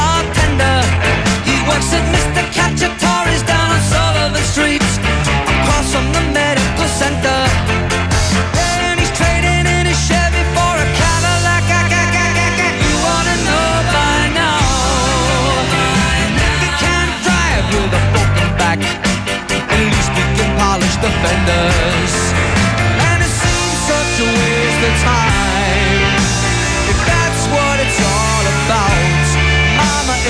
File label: rock